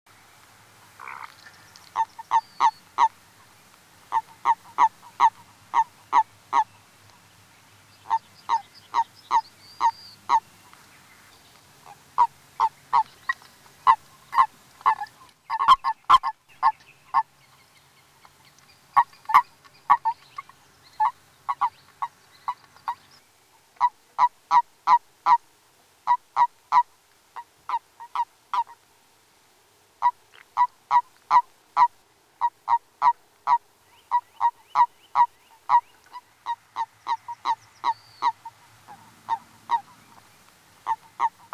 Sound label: Bufo bufo